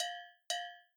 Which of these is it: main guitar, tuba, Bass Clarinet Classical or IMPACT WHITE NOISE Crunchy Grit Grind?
Bass Clarinet Classical